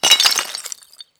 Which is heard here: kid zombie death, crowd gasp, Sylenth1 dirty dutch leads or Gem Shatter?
Gem Shatter